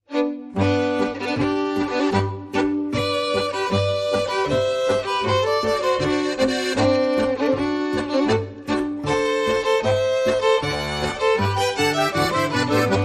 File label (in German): boarischer; bayernhans boarischer